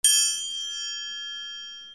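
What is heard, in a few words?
percussion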